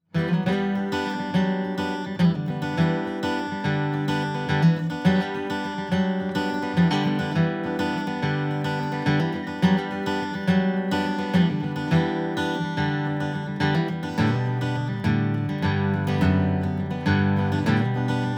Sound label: fender heavy